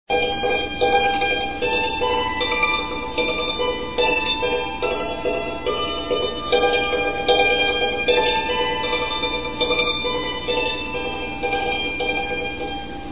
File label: dulcimer